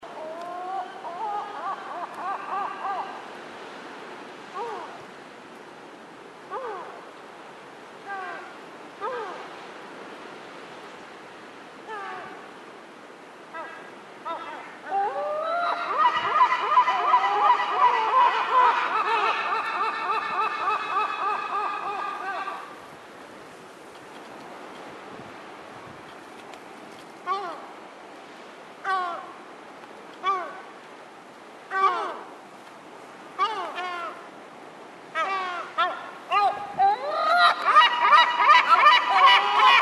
Silbermoewe

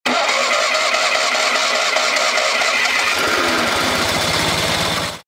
Fiat cinquecento Motorstart

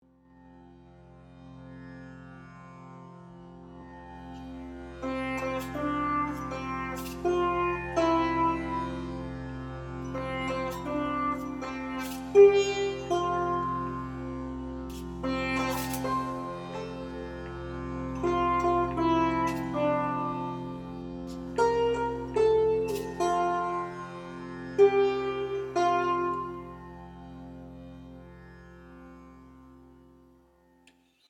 Geburtstag Sitar

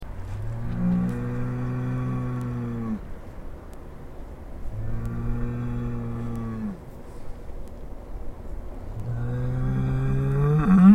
Stier

